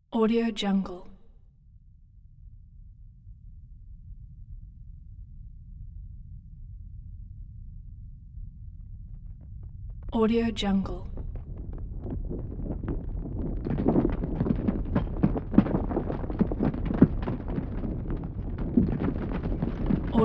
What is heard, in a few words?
avalanche with many rocks falling; hollywoodedge